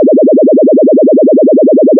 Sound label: fm raygun